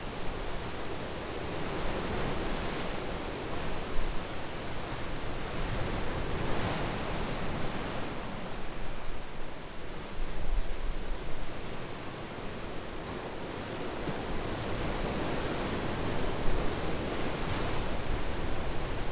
ocean

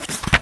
page

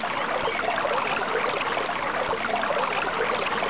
water bird

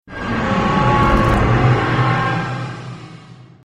squeal

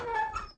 open

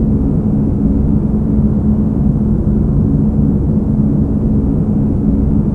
rumble; trek

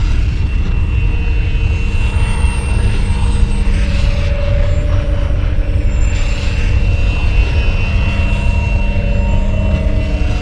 oblivion gate

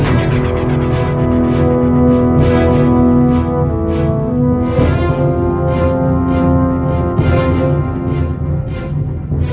E Suspence Music; trek

